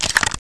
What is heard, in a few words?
reload; svencoop